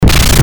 jumpscare; slender; sisters

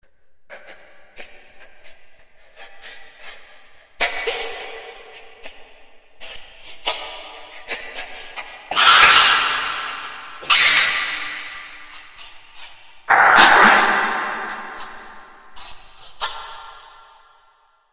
cry screams; slender

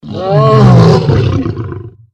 deathclaw stun; slender

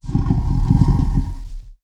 garg idle; slender

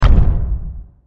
step; slender; guardian; foot

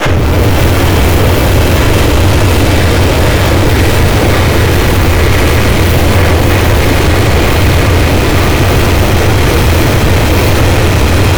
garg beam start; slender

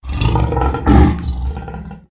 deathclaw idle; slender

